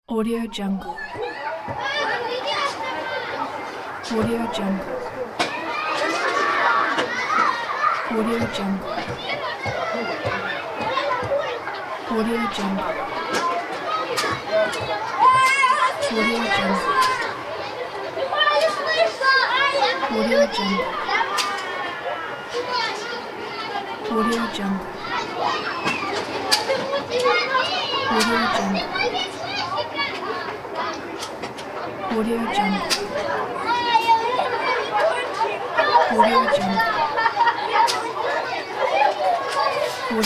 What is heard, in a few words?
kids playing in park ambience